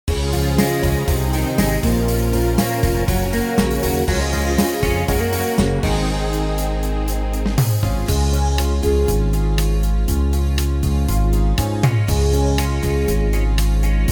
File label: beat easy; Wersi